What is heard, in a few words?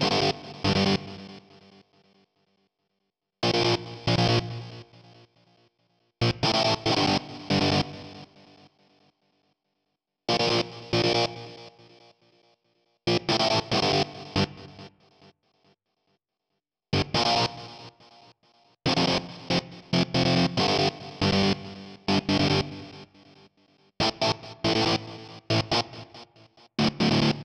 Engineering Guitar G; Bust n Rush